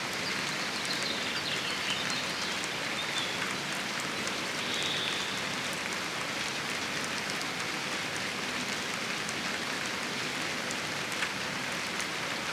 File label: Forest Raining Begins With Loud Birds